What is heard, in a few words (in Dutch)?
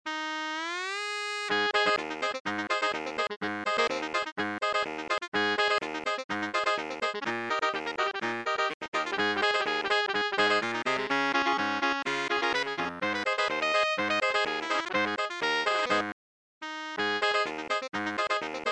saxkwintet bariton tenoren alt sopraan; Muziek Makerij Heeze; arrangementen voor blaaskapellen